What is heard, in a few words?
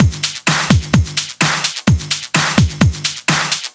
House Beat, 128 BPM; Vengeance Samples; VEH1 Loops